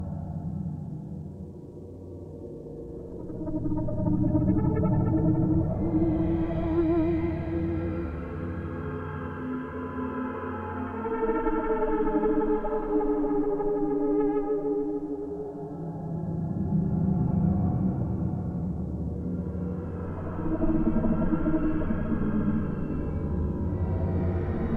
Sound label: sun drone